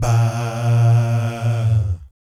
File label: VIB FX, Vocal Planet Jazz & FX; ILIO; JAZZCOMBO